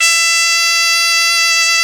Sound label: BOMBARDE F3, Celtic; USB Soundscan vol.42; AKAI